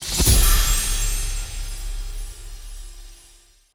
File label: big Win Text Change; netent